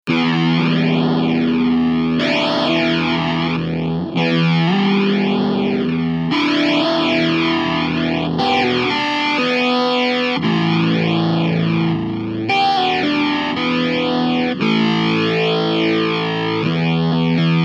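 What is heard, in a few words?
Em 116 guitar riff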